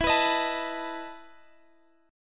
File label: E Major 7th Suspended 4th E; x,x,14,16,16,17; strummed